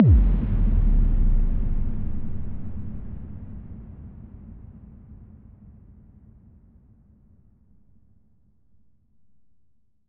Big Boom

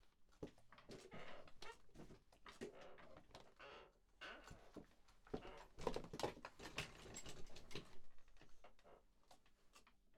Squeeking Chair

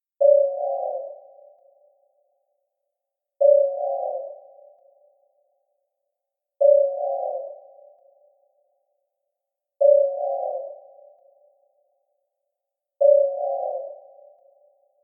Alien Beacon Sound Alike

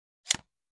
Camera Flash Opening

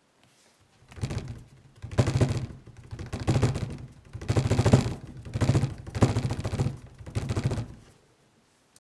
Earthquake

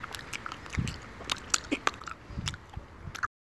Chewing

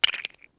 Lego Click

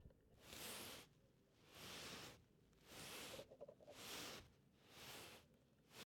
Moving Picture Frame

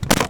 Cracking

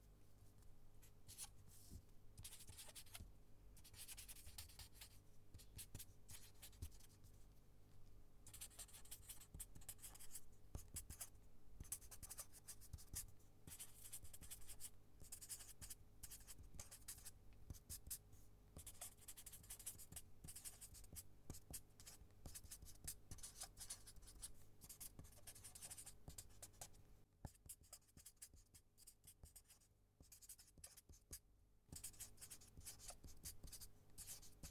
Writing On Paper With Sharpie

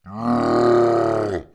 Deep Zombie

